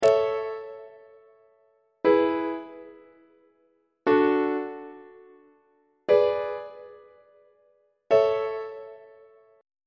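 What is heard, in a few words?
a major leading tone imperfect authentic cadence on treble clef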